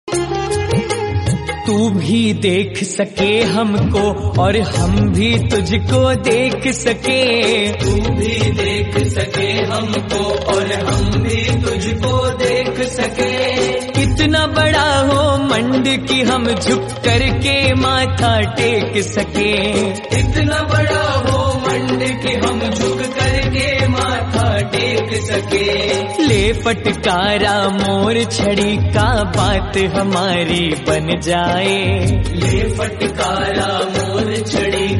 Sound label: Devotional Ringtone; Khatu Shyam Baba